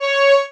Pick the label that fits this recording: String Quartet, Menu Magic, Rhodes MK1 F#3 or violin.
violin